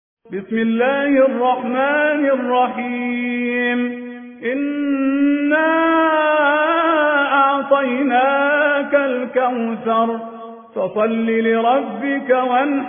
ترتیل; الحسینی العزازی; سوره کوثر

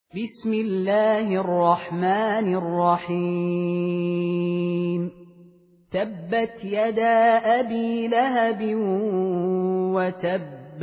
سوره مسد; خالد المهنا

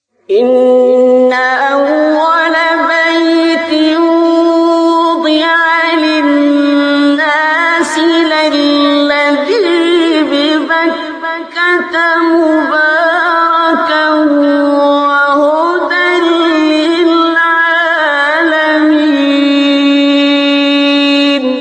تلاوت زیبای آیات سوره آل عمران; 96 97; عبدالباسط

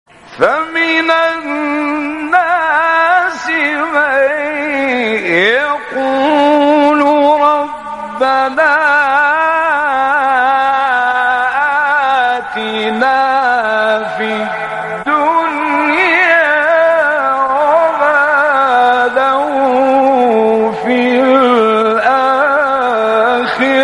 فرازی از آیه سوره; 200; بقره; استاد غلوش

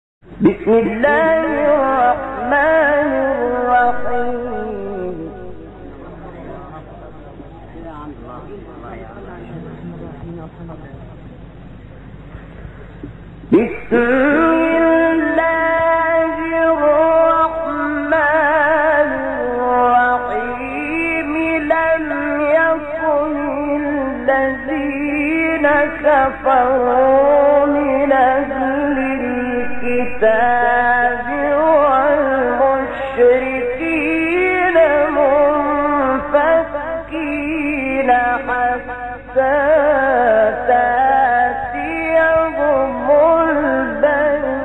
فراز زیبای ابتدای سوره; بینه; استاد شحات محمد انور

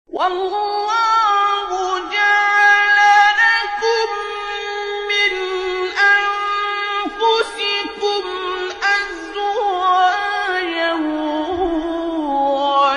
فراز زیبای آیه سوره نحل; آیه 72; استاد عبدالباسط

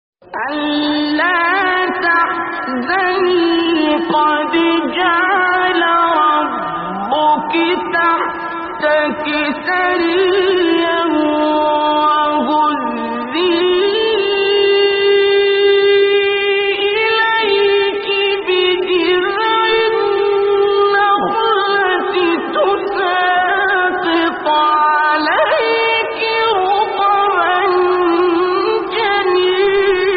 تلاوت زیبای آیه سوره مریم; آیه 24 26; استاد عبدالباسط

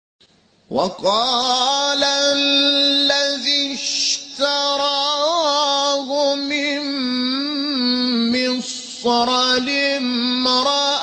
تلاوت آیه سوره; یوسف; استاد محمود شحات انور

